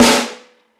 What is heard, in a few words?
sizzly snare one shot a sharp key